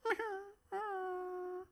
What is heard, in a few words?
Grub Sad Idle